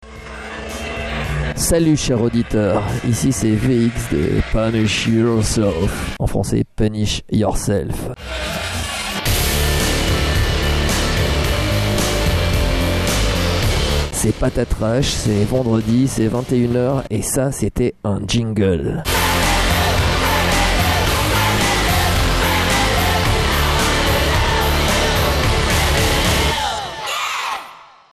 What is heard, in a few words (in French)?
jingle